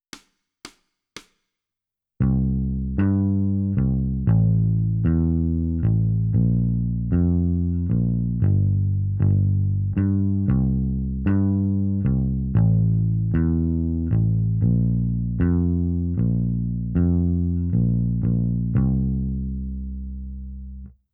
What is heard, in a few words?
Bass